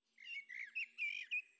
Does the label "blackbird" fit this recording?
Yes